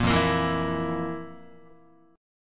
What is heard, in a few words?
F Major 7th Suspended 4th F, Guitar